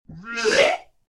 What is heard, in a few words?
vomit